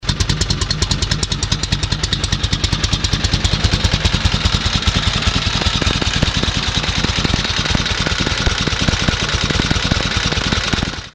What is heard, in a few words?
electraglide, motos